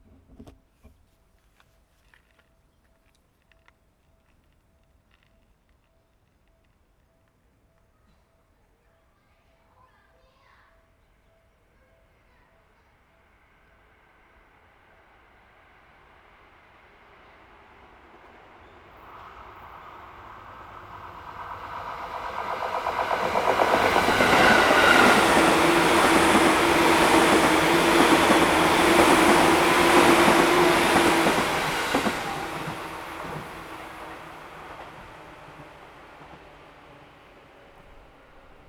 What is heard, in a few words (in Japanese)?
下り電車通過。; H2essential MS内蔵マイク指向性120°＋ZOOM ヘアリーウィンドスクリーン WSH-2e; 録音したファイルを、本体でノーマライズしました。